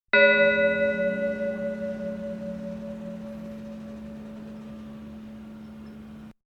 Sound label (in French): cloche; Camon